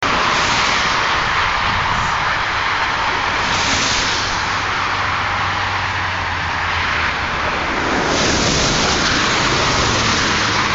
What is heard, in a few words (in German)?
autobahn